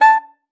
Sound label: A5, alto sax; Xsample VOL-2